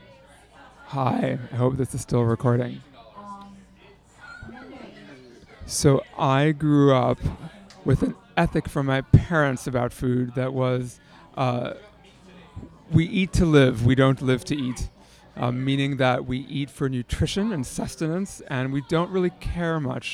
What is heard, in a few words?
Audience Recording; a-meal-premiere